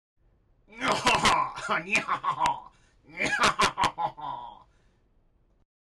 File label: Laugh; Hexxus